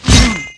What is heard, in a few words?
godhand attack; Eft